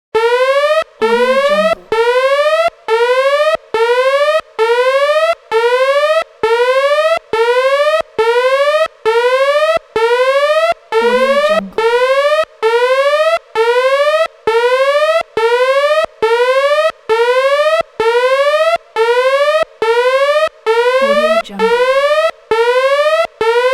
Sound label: contemporary factory alarm; آژیر خطر کارخانه; industrial sounds